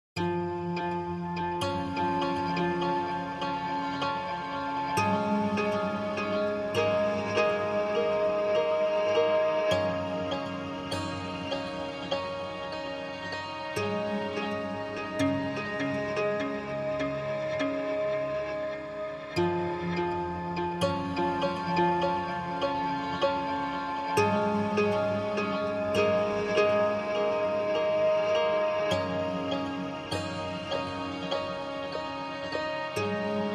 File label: Guitars Only